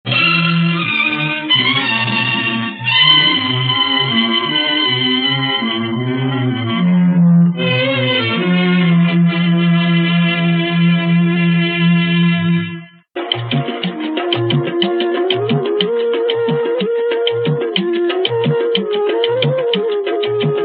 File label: Theme Instrumental; Sanjh Aur Savera